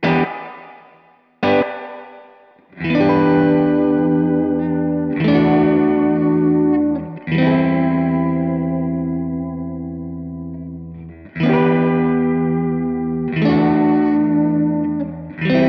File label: Plate Reverb; Harley Benton Dual Effect Duality